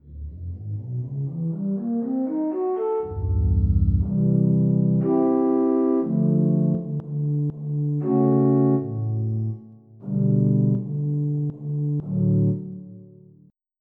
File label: Flute; EverQuest